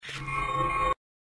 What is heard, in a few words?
portal sound; The Dream Machine